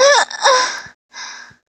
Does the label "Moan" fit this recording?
Yes